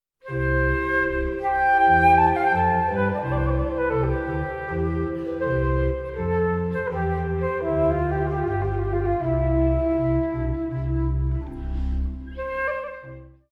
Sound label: cadences